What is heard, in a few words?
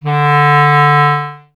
clarinet D, Solo Instruments; Miroslav Vitous - Solo Instruments VOL-1; 90 s Sample CDs